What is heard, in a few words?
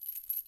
chain, step; blocks